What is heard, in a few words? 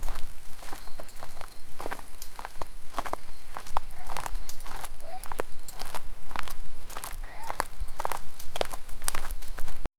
lion walking in jungle